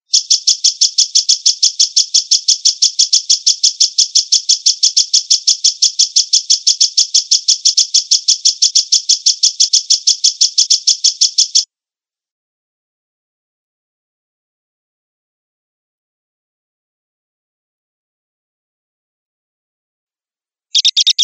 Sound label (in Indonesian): suara belalang